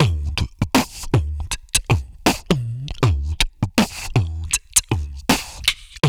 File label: VOC DRUM, MOUTH PERC; Best Service Hyper Dance